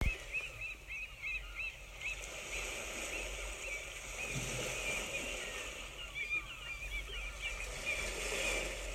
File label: gull